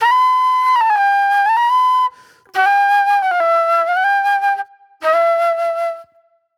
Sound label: Flute